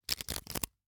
Hwatu, cardgame, hardplastic, small, clatter, stack, drop, loose; Eiravaein Works; Vaeyan IV